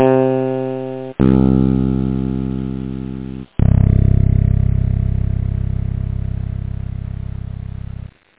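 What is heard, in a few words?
Finger Bass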